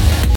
Smooth Jazz Cover

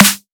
snare; Rialasten 2022 Xmas Sample Pack

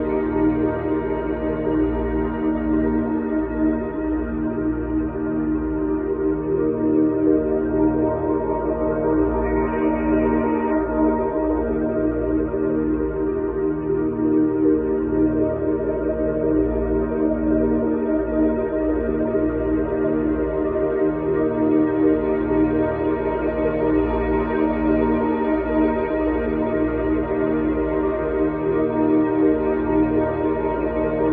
Ambient pad D#; Rialasten 2022 Xmas Sample Pack; 2022 Xmas Packs

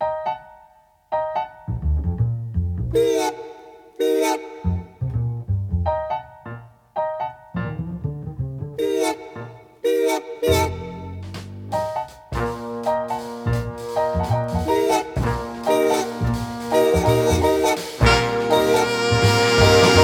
Gentle Forest Jazz

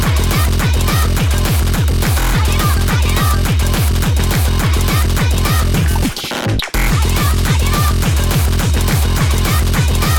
Mini Bassdrum

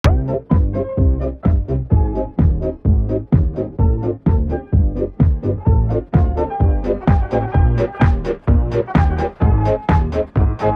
Electro Swing

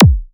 kick

